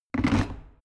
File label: plastic water boiler place hard